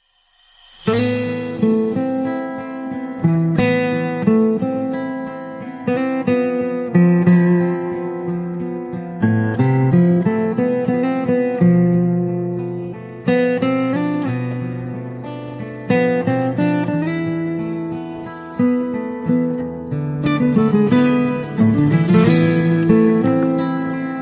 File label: instrumental melody ringtone